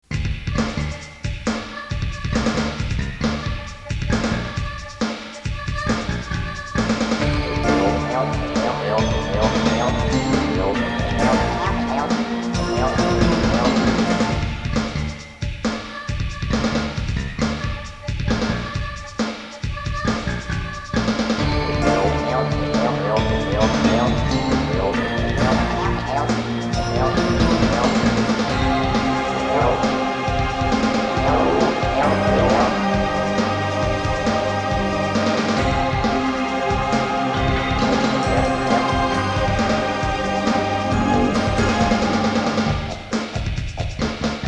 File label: ocean audio cassette theme music; voyager